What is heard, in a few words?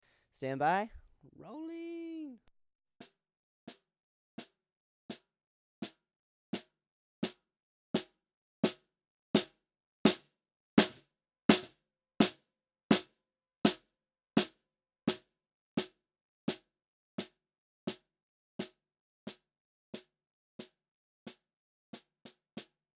Snare 2.5in from bottom